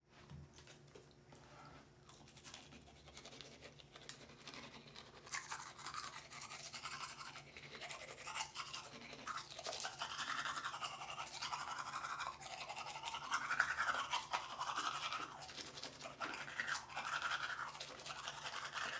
Brushing Teeth

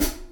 Closed Hat

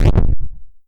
Retro Boss Step

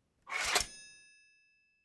Sword Draw

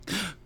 Male Gasp

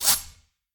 Swipe

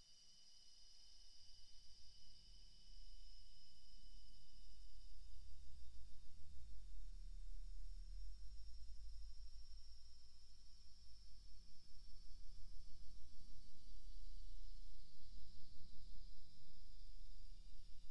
Suspense Ambience

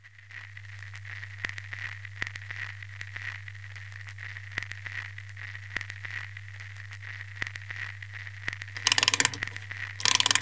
Music Box Creepy

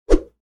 Whoosh Sound

